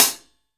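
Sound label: closed hi hat, 14 inch old hi hat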